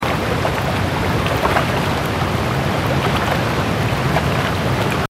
damage vehicle; World Of Warships

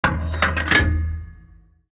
gun reload; World of Tanks

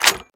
12mm nsvt mech start, guns tanks; War Thunder

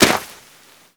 foot sand gritty, footsteps

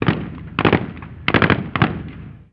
gun usassault med

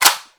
mod attach; Rust

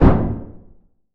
Aircrafts P-51D Cockpit Gear Door Broken In; DCS

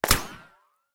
bullet ricochet; War Thunder

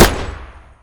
Inf Weapons; Red Orchestra 41-45

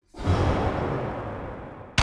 minigun start far; buraq

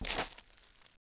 Hoe Dirt; Civ4 warlords

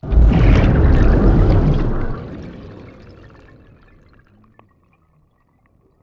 ambient; World of Tanks

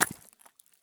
run glass; Escape from Tarkov

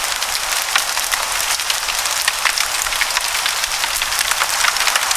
rain foliage

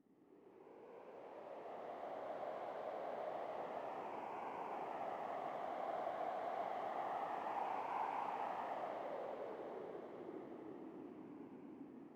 sting wind gust; Rust

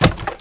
Wood Shield Drop; Civ4 warlords

